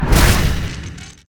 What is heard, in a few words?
projectile launch; Dota 2; Necrophos